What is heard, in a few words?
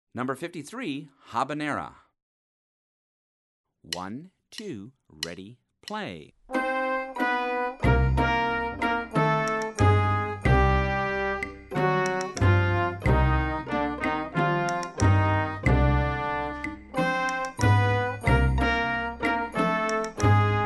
habanera